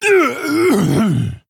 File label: Male Orc Death